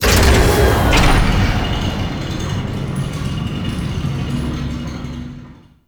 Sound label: connector start; ambience; Warframe; Entrati